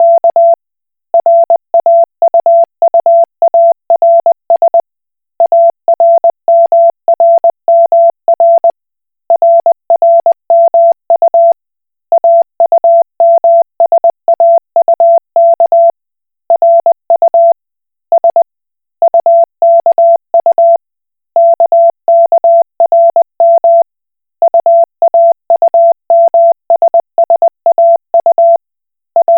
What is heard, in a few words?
Koch Morse